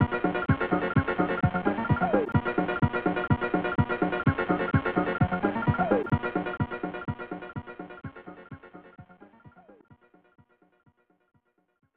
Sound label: Bonus; Super Putty 1993 SNES